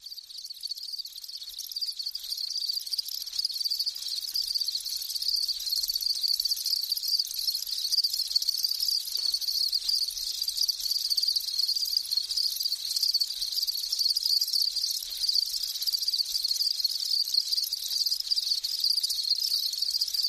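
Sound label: Bed Crickets Chirping, insects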